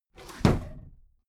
desk drawer close heavy

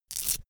velcro open cap quick

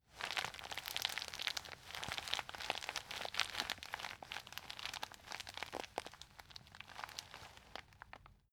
plastic bag handle long

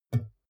glass door small open tap

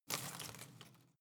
home plant leaves rustle short

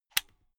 unplug safety plug

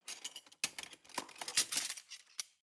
cutlery large multiple drawer grab soft

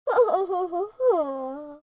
child laugh fake

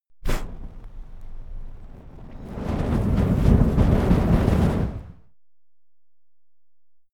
flamethrower medium whoosh single blow

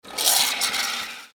cappuccino machine

